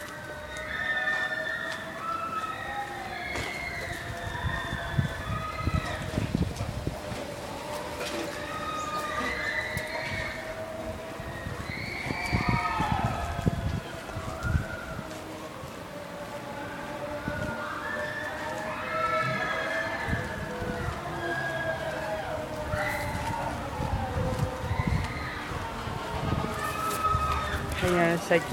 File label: People On Carnival Rides